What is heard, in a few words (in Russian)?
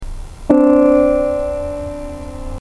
interval b sekunda